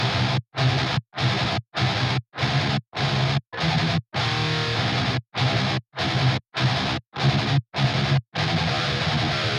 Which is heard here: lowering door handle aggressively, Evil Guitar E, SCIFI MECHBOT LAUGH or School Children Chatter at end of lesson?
Evil Guitar E